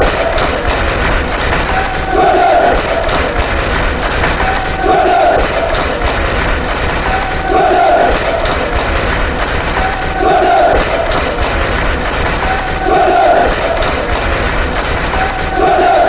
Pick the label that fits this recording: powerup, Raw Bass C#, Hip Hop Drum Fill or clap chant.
clap chant